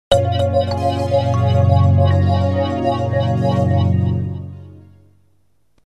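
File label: fanfare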